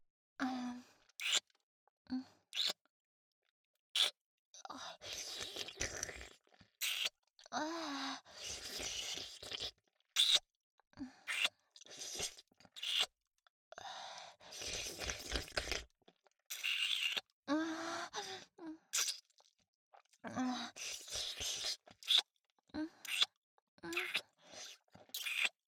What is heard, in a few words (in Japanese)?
キス, ASMR; アドリブ集